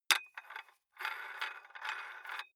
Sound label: dumbbell close nut thread, fitnessstudio